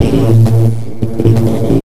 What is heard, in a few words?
LOW 135 SYN, Big Beat